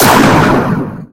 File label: assault cannon